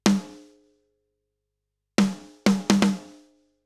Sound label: Snare Process; kirchhoff